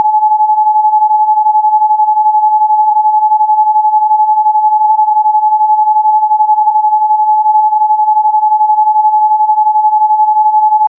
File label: submarines sonar pinging